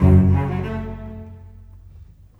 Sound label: Bass, Cello, Viola, Orchestral, Rock Pop; Sonic Foundry (Sony Creative Software); Orchestral Series VOL-4 - Rock and Pop